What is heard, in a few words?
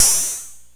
hh shake; opl3; M8